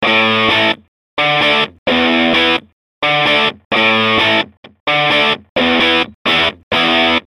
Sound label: Electric